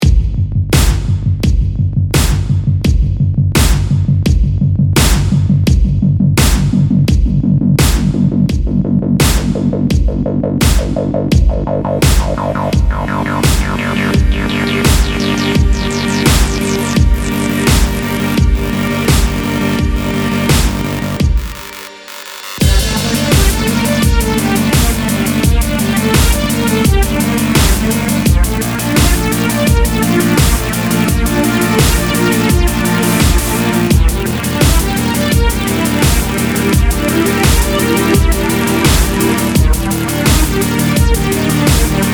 Motorcycle Midnight Drive w Loud Lead Electro Driving